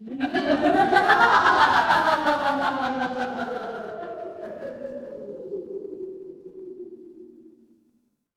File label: crowd laughter